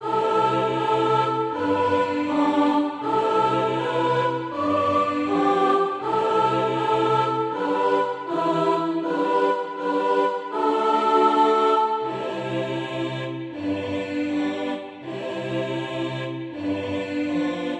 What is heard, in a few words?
sopran